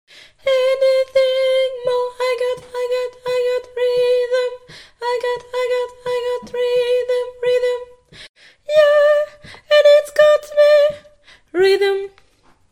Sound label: tenor